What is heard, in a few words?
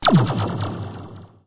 tower; DonkeysAndDroids.Godot